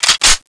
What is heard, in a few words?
slide forward; czero opforce